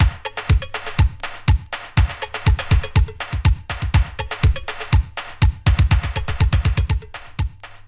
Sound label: 122 bpm 80s breakbeat b key, Free drum loop - kick tuned to the B note. Loudest frequency: 1695Hz; drum loops